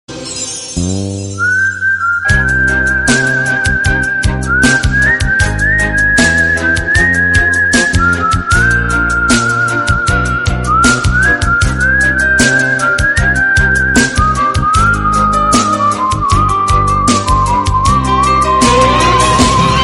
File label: melodious romantic whistle tone